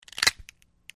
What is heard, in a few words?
Break Or Snap Vegetab; punches kicks hits bones